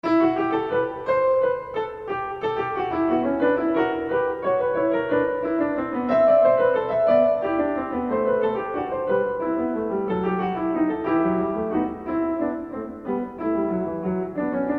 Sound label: Verso, in E minor; Marlais Book 4